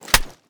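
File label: sniper reload empty fast extended mag mag hit; gmod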